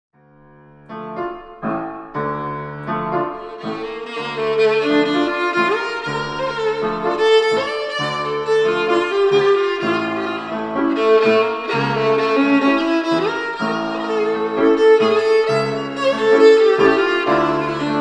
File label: Violin And Piano Duo